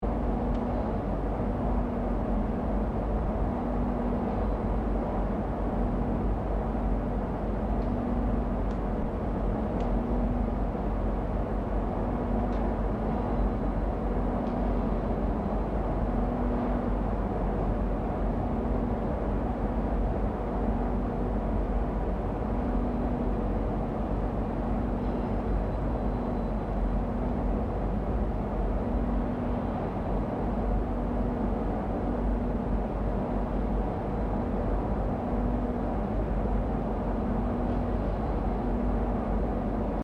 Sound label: industrial site; sources of noise; 2007 noise surveys